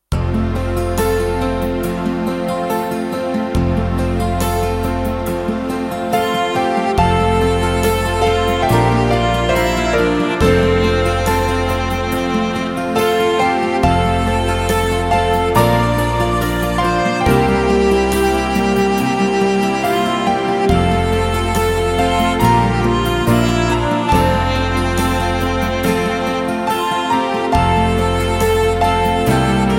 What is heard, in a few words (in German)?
Mundharmonica